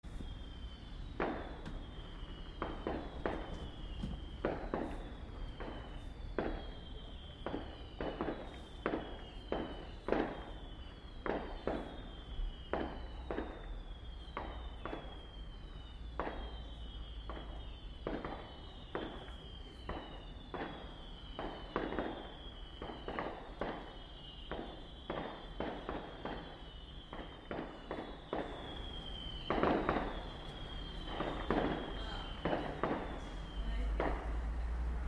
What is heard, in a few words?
whistling fireworks in richmond